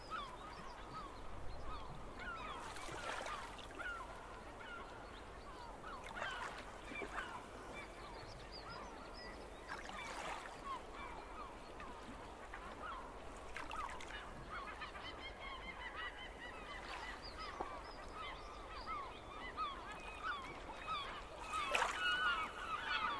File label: seagulls